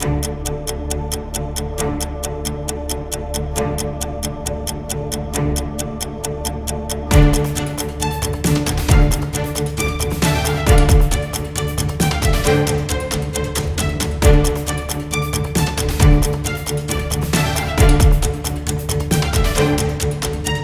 haber; By Burak Sound Bank; haberler news

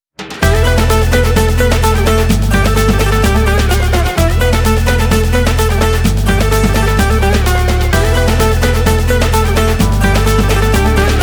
lorke halay enstrumantel, kurtce; by burak sound bank; anadoludan selam var vol 25; turkish anonimler; diyarbakir

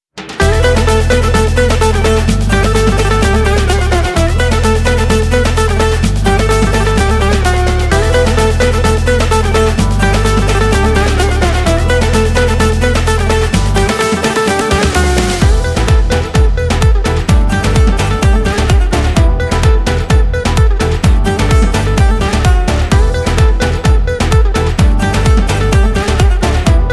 lorke halay playback, kurtce; by burak sound bank; anadoludan selam var vol 25; turkish anonimler; diyarbakir

